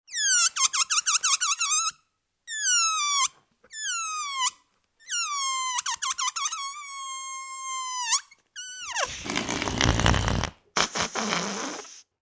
Balloon Squeaking and Singing then Air Out, Balloon Squeaking and Singing then Air Out Fart; Balloon Sound Effects